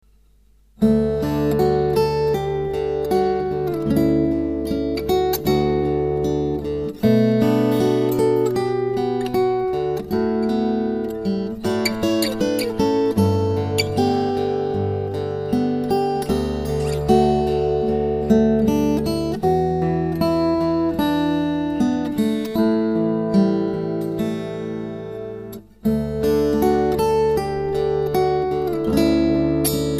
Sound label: pickup sample mix